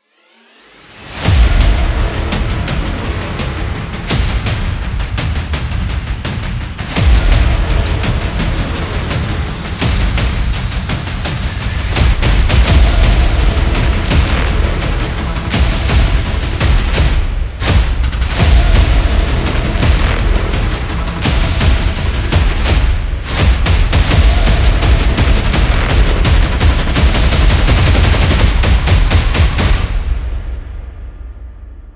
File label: Drums of War